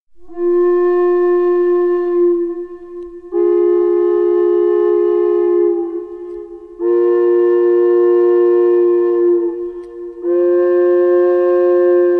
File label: la drone, indian flute